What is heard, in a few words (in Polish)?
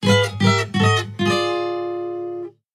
Gitara